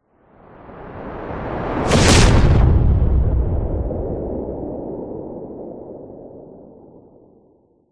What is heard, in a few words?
science fiction impact explosion spacecraft blow up missile; zapsplat